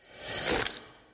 kampf fleischwunde; magic 2014

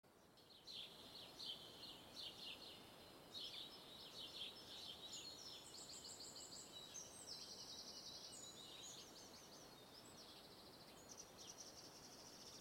morgen voegel fruehling; Stardew Valley

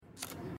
menu schliessen; life is strange

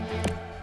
menu bestaetigung; national hockey league 2019

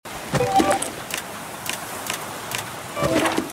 inventar; The Flame in the Flood

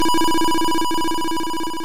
hero tod

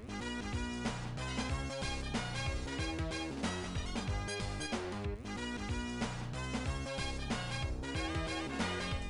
menumusik; jazz jackrabbit 2

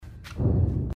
feedback knopf druecken; inside

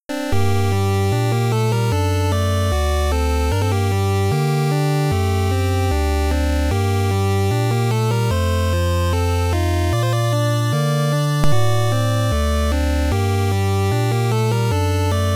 menumusic, Menu Music Im Vergleich zur Level Musik fast schon neutral.; 8 eyes

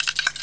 schlüssel; Tomb Raider PS1; lara

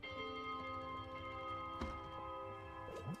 glocke; spiritfarer

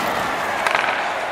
passspiel; national hockey league 2019

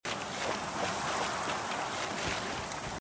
footsteps wasser; The Flame in the Flood

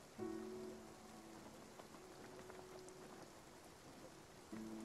regen; spiritfarer

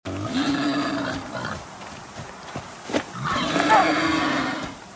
wildschwein; The Flame in the Flood

